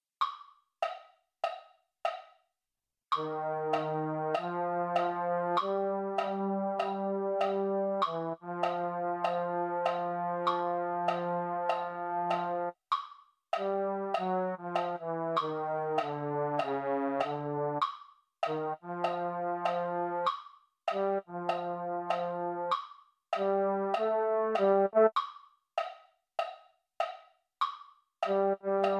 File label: baritone, MIDI; Euphonium 1; mini corps